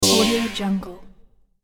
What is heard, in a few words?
robot sound ion pulse; sonic-boom